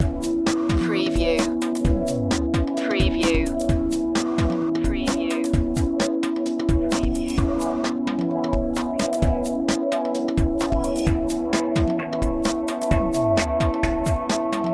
trade routes loop

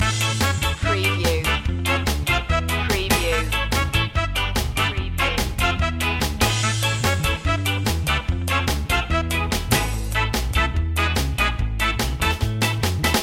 ska mad loop

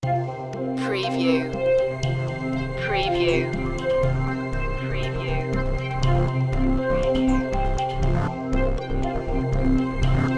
steam rhythm

